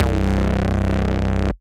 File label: Square Smak Bass; Synth